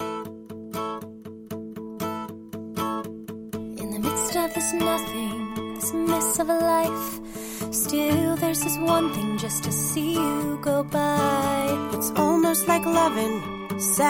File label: My Junk with vocals